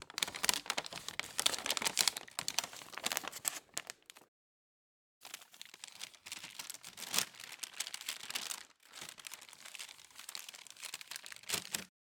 Brown Paper Bag Crumble And Shake

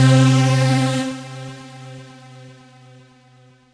Trance lead C

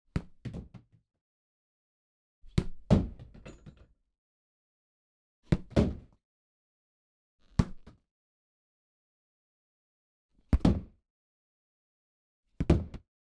Football Kicks

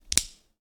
Switchblade

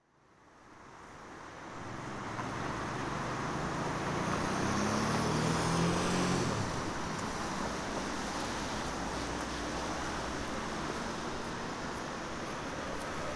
Busy Street Corner

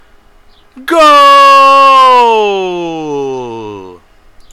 Goal

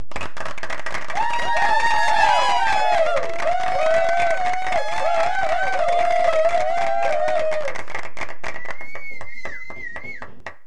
Applause

